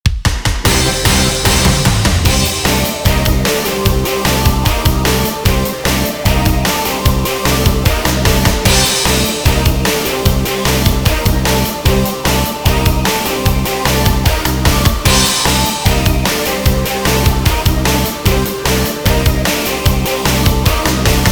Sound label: inst